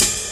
Hats Real Open

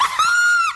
laugh

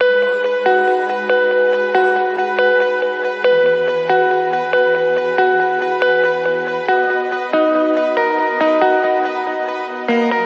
uplifter, Electronic Loops

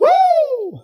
"woo", voice

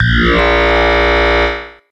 yeeahhh

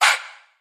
"ha" exhale; ooh ahh

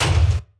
brush, Ticks; Misc Percussion

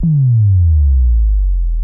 thumper; Heartbeats

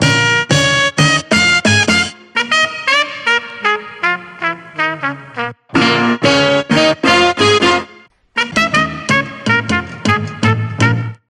horns a bunch of stabs

